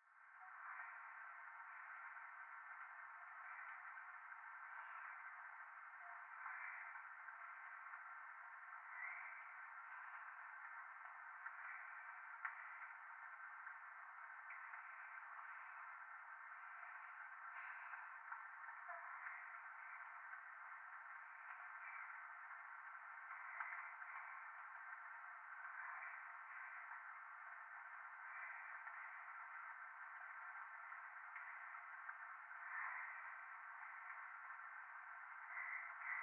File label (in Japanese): トイレ 右耳 密着 吐息 雨